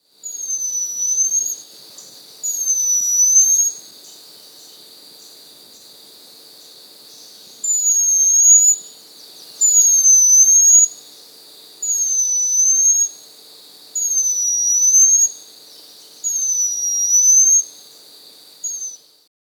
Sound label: Shrike-Like Cotinga, Laniosoma, S. America; Laniosoma Elega